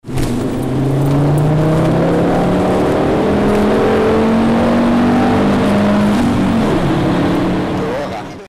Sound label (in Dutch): motorgeluid